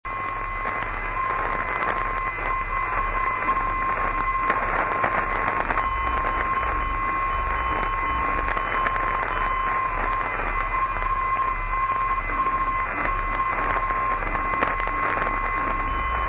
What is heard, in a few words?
gja 412khz, ndb